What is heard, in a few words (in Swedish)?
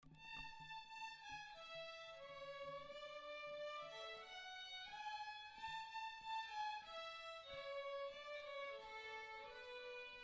Dunderschottis